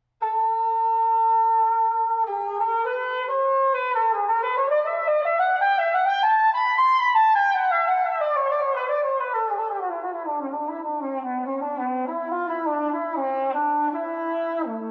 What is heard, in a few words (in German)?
zink; musikinstrumentenmuseum